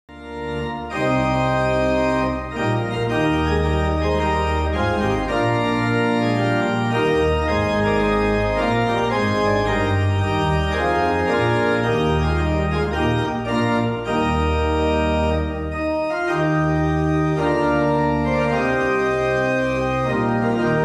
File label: festival march; Service of Worship